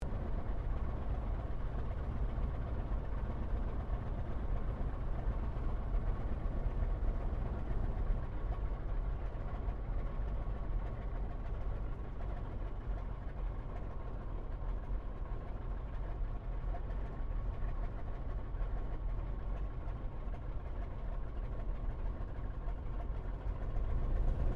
AIRCRAFT SOPWITH PUP REPLICA TAXI SHUTDOWN INTERIOR; Airplanes; 1916; Replica of a 1916 Sopwith Pup